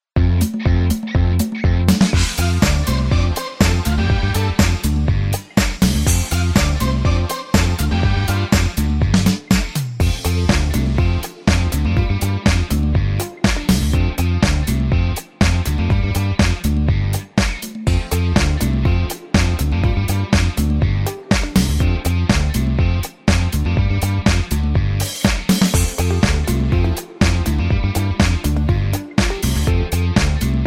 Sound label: music